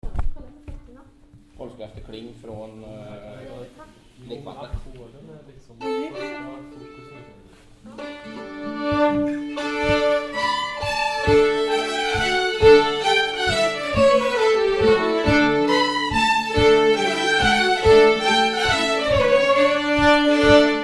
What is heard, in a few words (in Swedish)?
Polska; Polska efter Kling, Lekvattnet